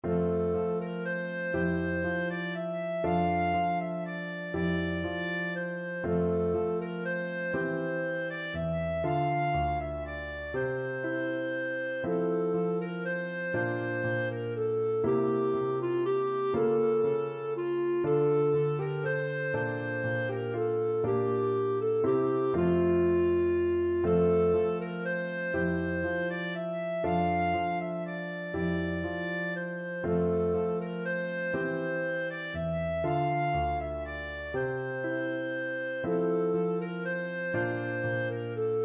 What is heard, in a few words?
El Noi de la Mare, clarinet